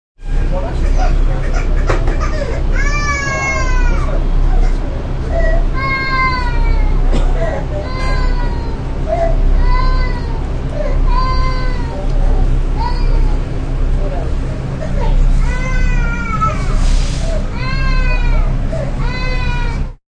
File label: Baby crying on a subway.; Crying Sound Effects; Voice